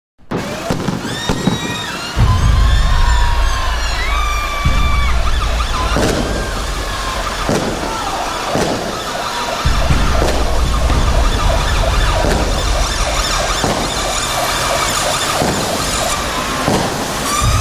Terrorist Attack, Terrifying terrorist explosions in city with civilian panic and general chaos.; Bomb Sound Effects